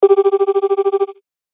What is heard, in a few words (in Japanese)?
電話 呼び出し音